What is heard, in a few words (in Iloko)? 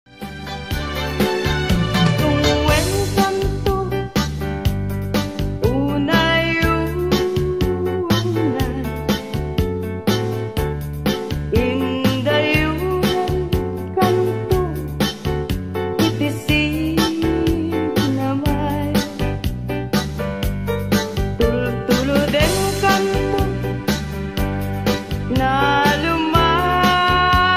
ilocano song; dungdunguen kanto